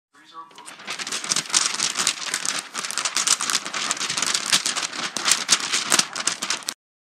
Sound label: Shaking paper